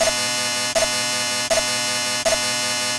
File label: sweating